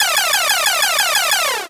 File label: multimedia game retro laser repeat level complete